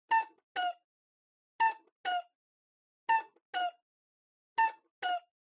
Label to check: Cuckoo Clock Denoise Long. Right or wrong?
Right